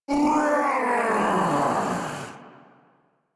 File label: Attack Roar, Boss; Sargas Ruk